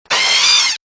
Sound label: Alien Xenomorph